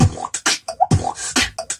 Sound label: quaking shake, Beatbox, Drum loops